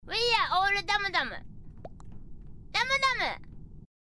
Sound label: polka dumu dumu